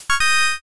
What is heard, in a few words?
next preview